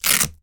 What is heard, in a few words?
foley velcro; sound effects one